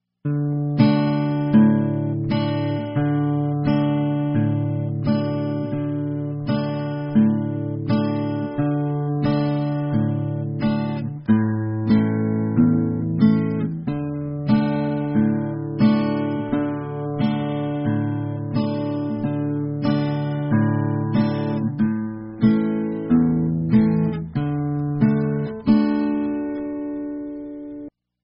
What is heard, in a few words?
guitar